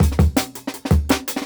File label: 164, Jungle Breaks